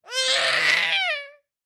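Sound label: sounds of newborn crying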